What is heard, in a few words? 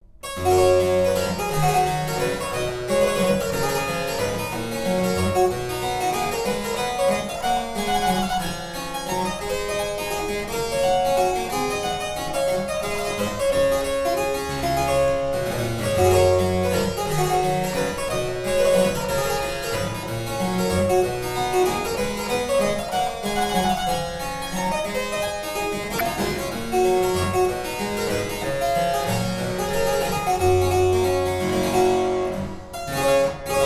Harpsichord Suite No3 in G major, Courante; Z662